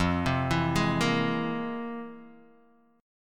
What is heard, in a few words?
F Minor Major 11th, F, Guitar, DADGAD; 3,3,2,1,1,x; down; chord shape